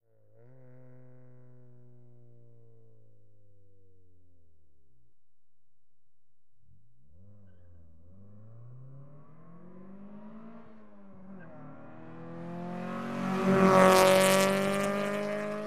Integra, quick far approach, fast by, quick decelerate away, Acura Integra